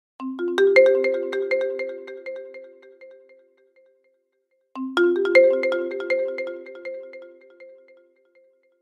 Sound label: Marimba Echo